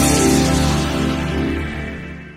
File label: Bootup